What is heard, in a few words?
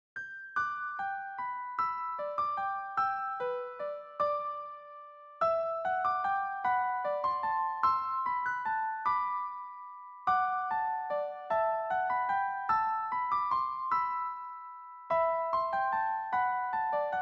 musicbox, piano